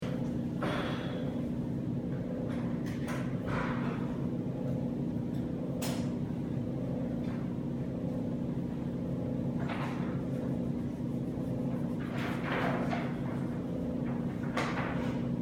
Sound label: HVAC in design class